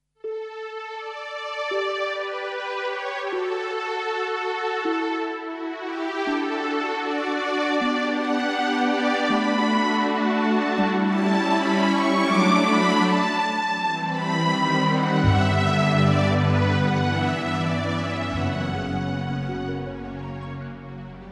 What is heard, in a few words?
Romantic Song